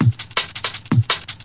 straight up sharp dnb, drum loops and breaks, 164 bpm; 161 to 180 bpm